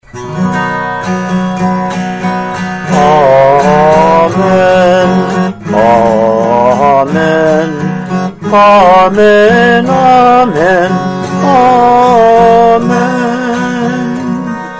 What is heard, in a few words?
Great Amen; SMMC Service Music; Lent - Easter